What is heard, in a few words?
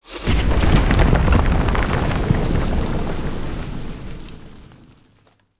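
tower collapse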